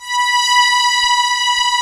COMBO STRINGS; OMI - Universe of Sounds

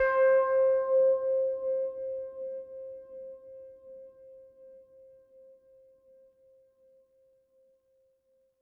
Synth Prophet One Shots, Keys C; Electronisounds

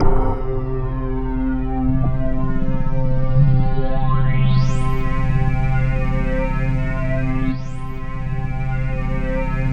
C2, Synth Line; 90 s Sample CDs; NiceBeats; SynthLine VOL-2

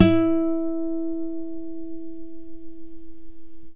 NYLON GTR

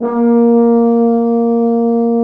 Orchestral Brass

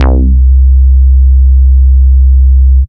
Single Electric Basses, Dance Floor Bass

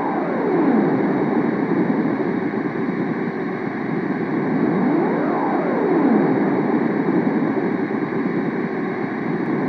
SUKER ATMOS

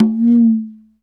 WOODO, PERCUSSION; Crypton - DrumBase5000

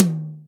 DRY, Acoustic Tom

